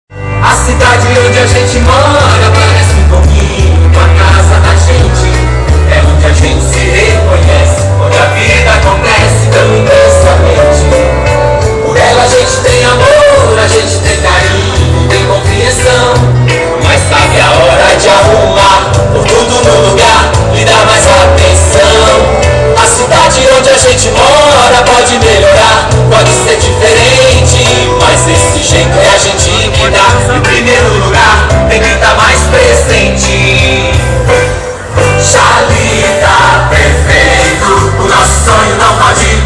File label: jingle